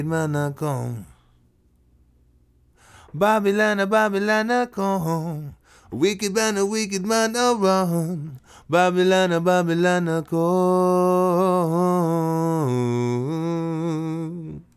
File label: Vocal Lead